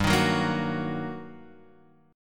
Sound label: G Minor Major 7th Sharp 5th, GmM7#5 chord; strummed; x,x,x,0,3,1,0,3; JGuitar